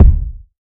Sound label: boomy kick one shot d key